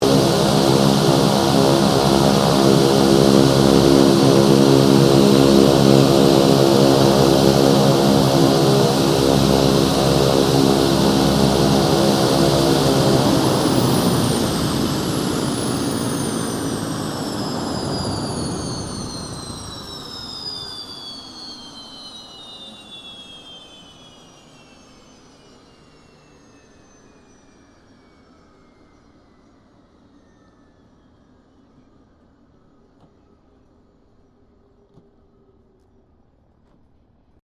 AIRCRAFT PC-9A SQUADRON SHUTDOWN STEREO, Roulettes air display; Pc-9A Squadron 5 Roulettes; Airplanes; Vehicles